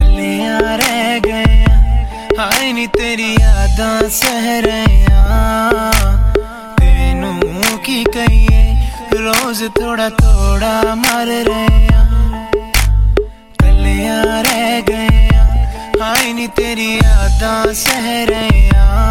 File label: sad punjabi ringtone